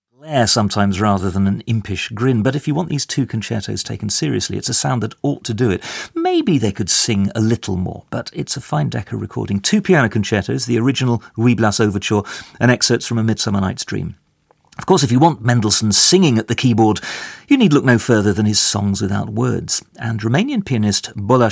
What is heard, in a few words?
concerto in G minor for piano and orchestra; concerto no 1; op 25